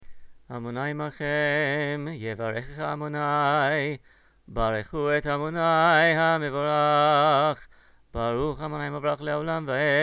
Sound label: torah pre, ta amim; Blessing before the Torah; Torah cantillation; Ta-ngamim